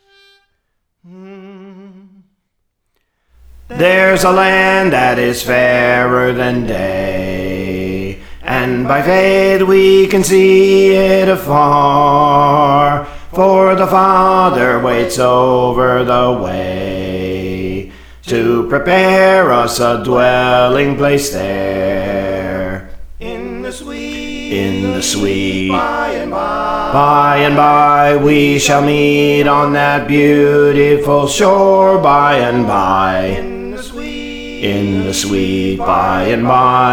Bass, Praise For The Lord Training

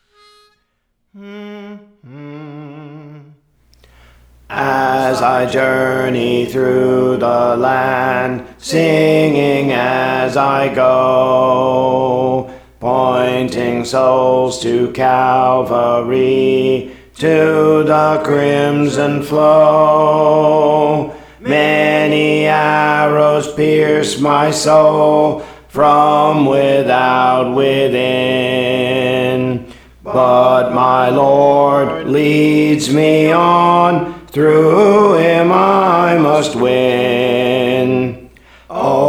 Alto, Songs; Praise For The Lord Training

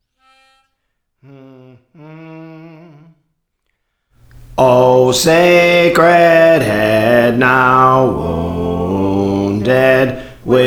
Bass, Songs; Praise For The Lord Training

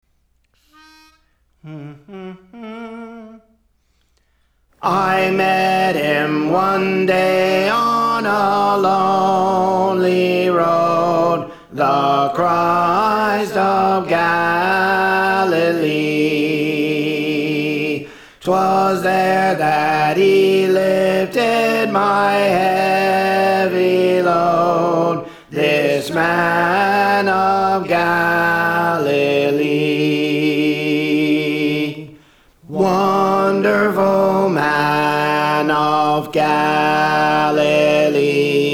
Alto; Praise For The Lord Training

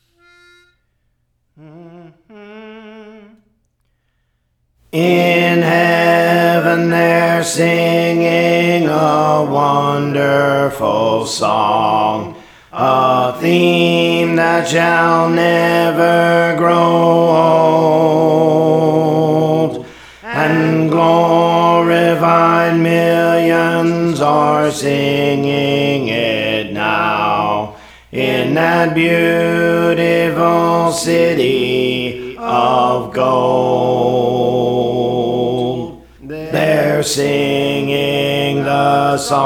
Alto; Praise For The Lord Training

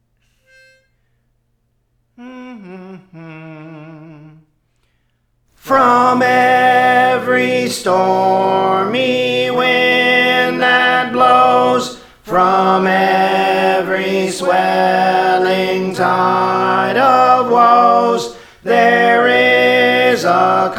Tenor; Praise For The Lord Training

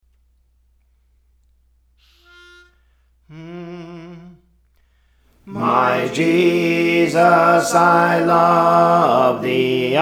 Alto, Songs; Praise For The Lord Training; My Jesus I Love Thee

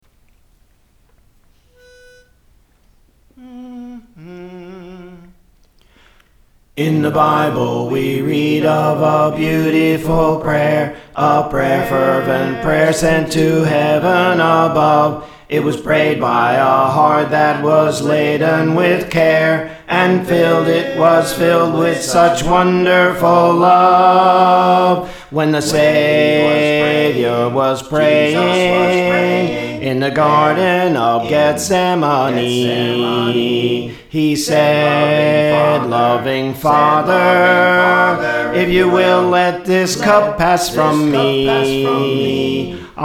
Alto; Praise For The Lord Training

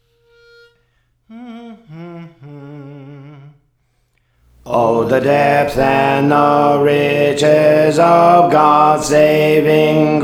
Alto, Songs; Praise For The Lord Training

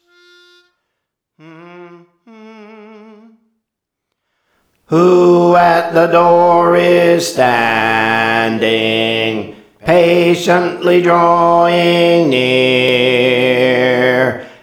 Bass; Praise For The Lord Training

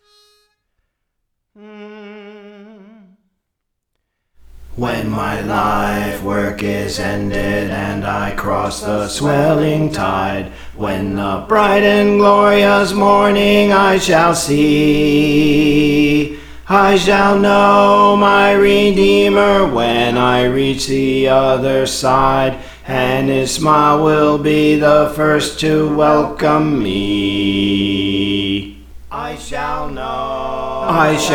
Bass; Praise For The Lord Training

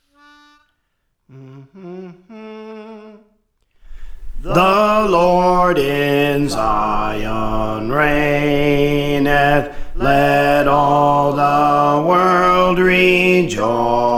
Bass; The Lord in Zion Reigneth; Praise For The Lord Training

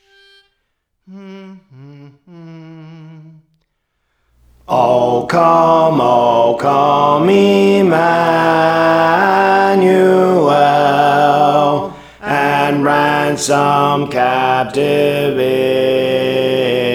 Alto, Songs; Praise For The Lord Training

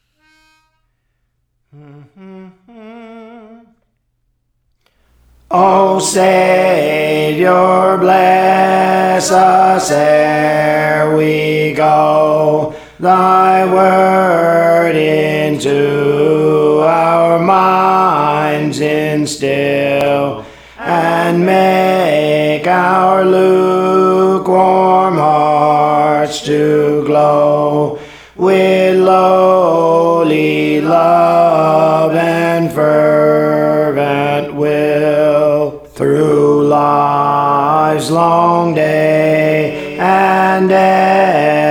Alto, Songs; Praise For The Lord Training

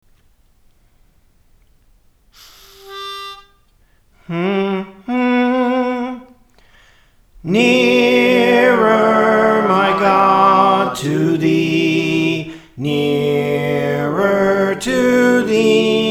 Soprano, Songs; Praise For The Lord Training; Nearer My God to Thee

